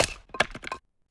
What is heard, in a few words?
Split Wood